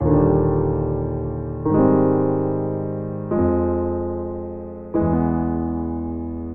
Progression Haunting 3/4 Eb Major 110 bpm, Grand Piano; MIDI Clips; Ableton; Factory Packs